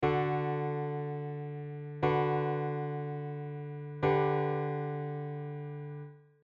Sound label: Pedal Tone